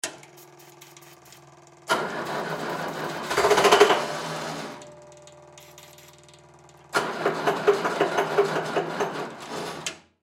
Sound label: Forklift Start Stall; Rumble Stall Twice; Machinery; Machines; INDUSTRY